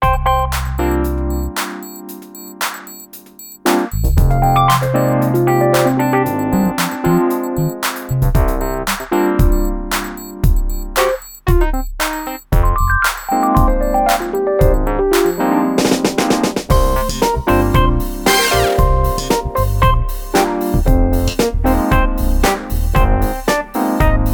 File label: Cheep plastic Instrumental; Roger Onj's